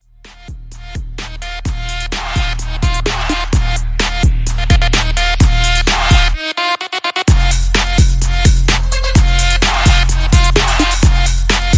Bass Shaker

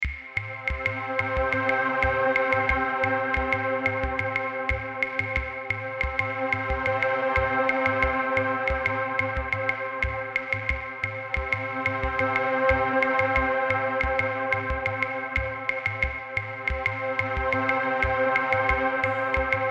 Indian Strings

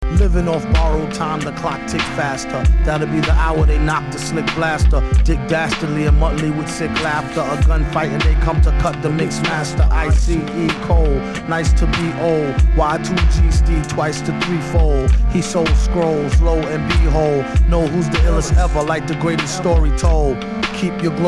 Accordion

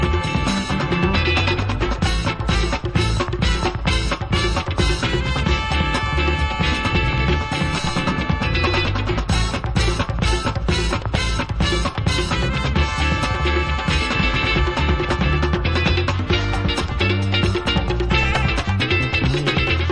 Instrumental

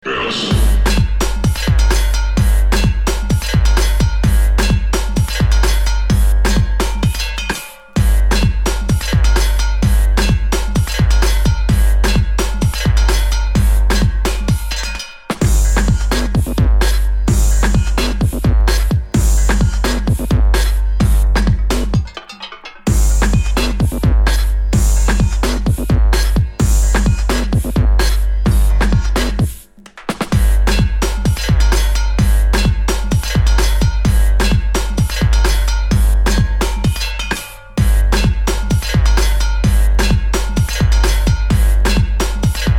Bells; Bass

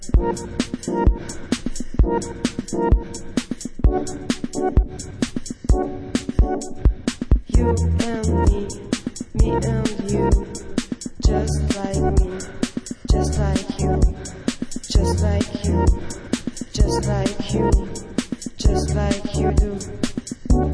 jogging beat

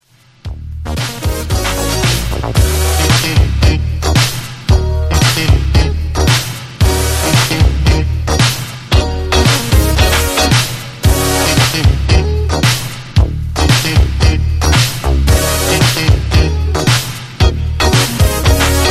Instrumental; disco

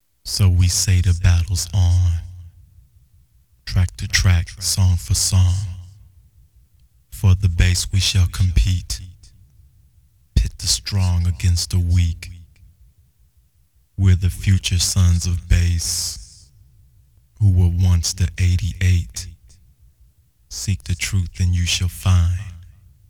Acapella

